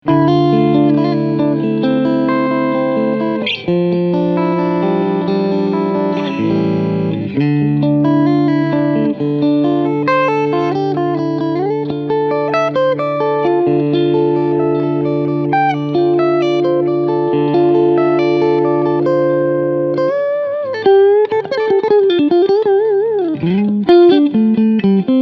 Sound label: Front Mitte Clean